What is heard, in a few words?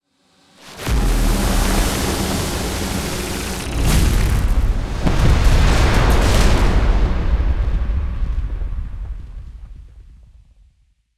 Sound Boss Destruction